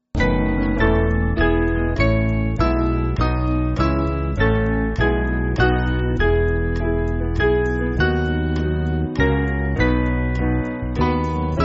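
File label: Piano, church backing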